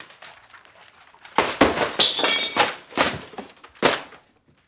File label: Books Thrown Across